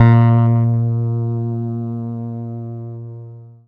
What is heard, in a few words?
PLUCKED, Synclav FM, A#; Big Fish Audio